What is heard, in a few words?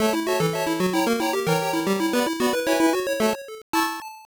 Round Up; opa opa; system e arcade